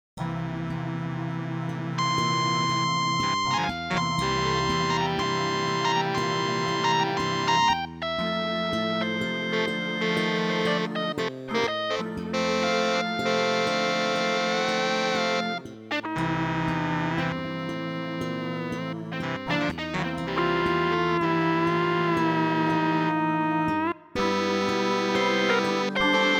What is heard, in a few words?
MIDI blues